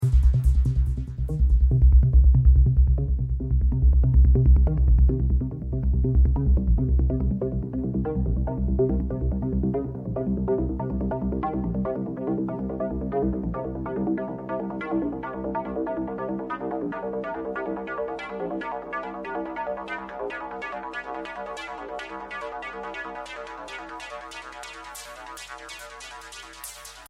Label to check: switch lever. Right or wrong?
Wrong